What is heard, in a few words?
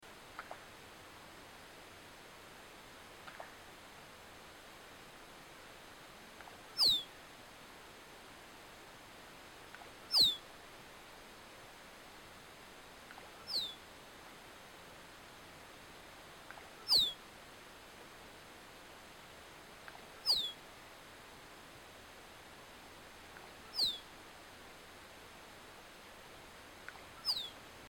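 musk duck biziura lobata; lopastnaya utka; utok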